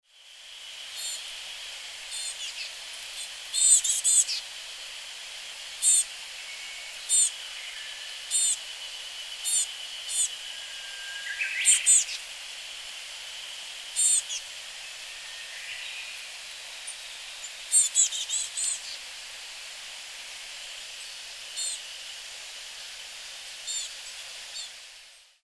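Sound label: kogara, Willow Tit; koe; hyogo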